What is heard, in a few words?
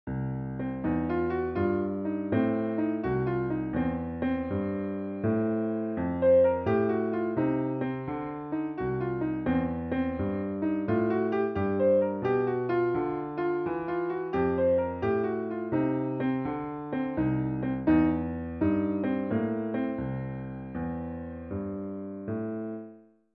Blues, piano